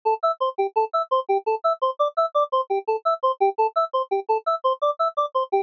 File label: Arp; O2